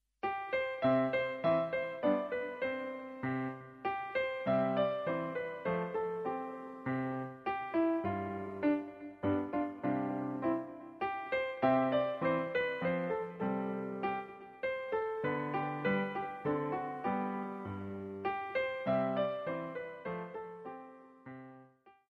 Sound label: menuet